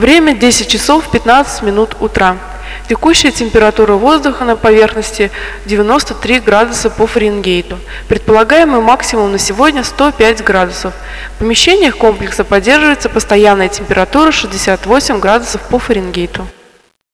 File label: tram